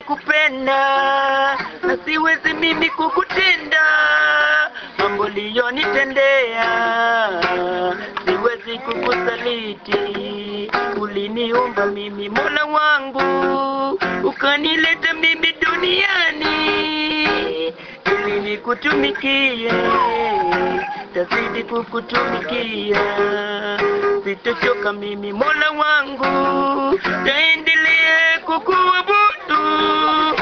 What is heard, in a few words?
Guitar